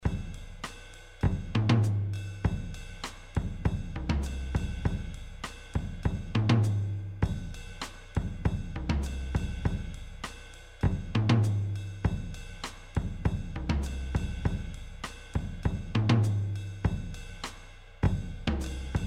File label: 60s drums clean